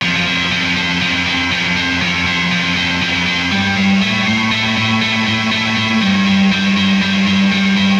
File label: GTR